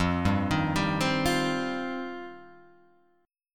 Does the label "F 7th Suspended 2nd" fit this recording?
Yes